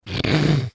snorting

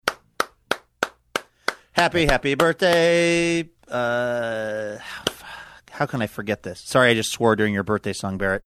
clapping saying happy birthday; fuck how can i forget this

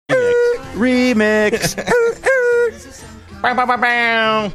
making air horn sounds saying remix

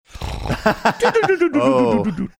making funny snoring sounds

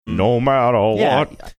singing no matter what

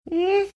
skeptical sound

